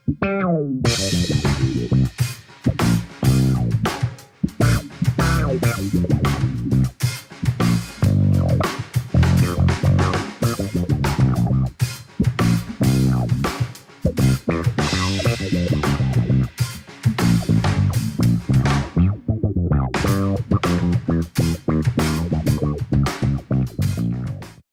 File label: Low Pass Filter setting on Bass; electro-harmonix nano q-tron